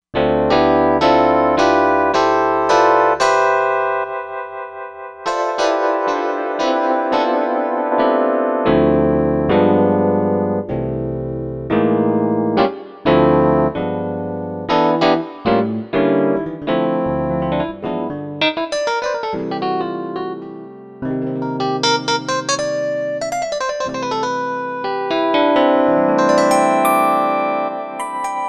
Ring Mod EP

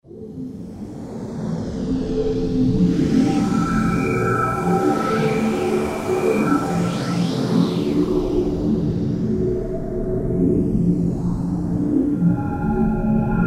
Roland JV-2080, Dunes

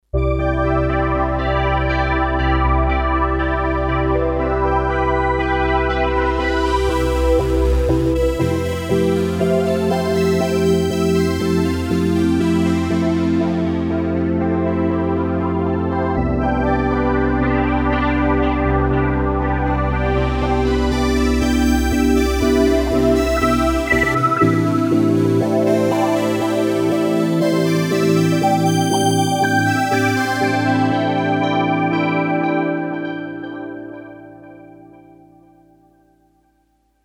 Pad Layer

